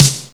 raw snare drum C sharp key, Loudest frequency: 3469Hz